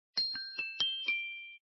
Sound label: Respiration